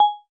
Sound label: Video Record, ui; MagicOS 7.1